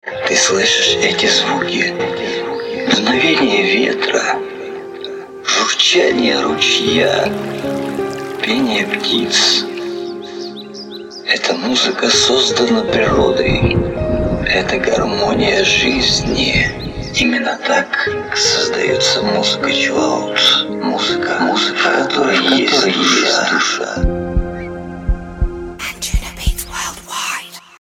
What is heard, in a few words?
intro Chillout